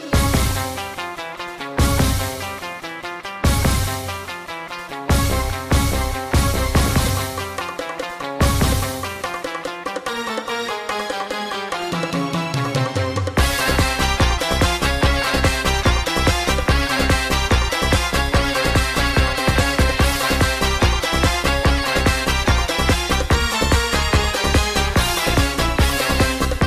Balkan Turbo Folk

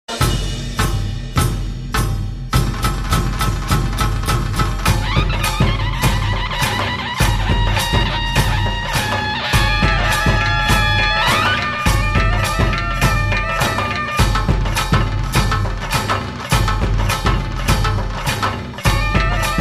Folk Music

